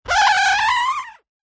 poster holographic scratch grooves with fingers whizz sound; foley; zapsplat